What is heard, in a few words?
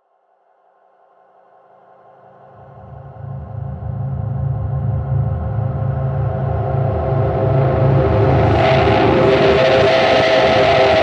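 Reverse Grinding Wind; Cinematic Metal Impacts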